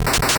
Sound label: 8bit se